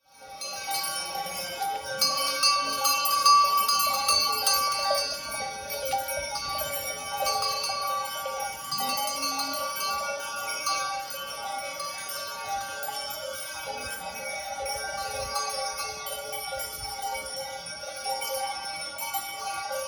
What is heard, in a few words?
Goats going home; Sabugueiro Serra da Estrela PT